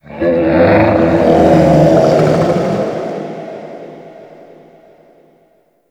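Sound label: Settlement Distant Animal Ambience; Warframe; Grineer Settlement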